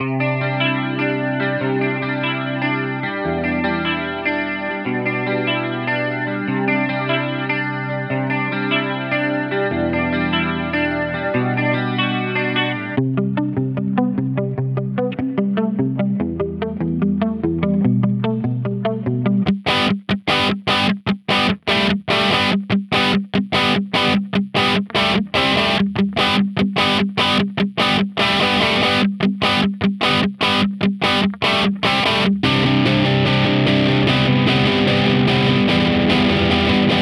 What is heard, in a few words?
Pop Punk Riffs; Signal Path Mike Naran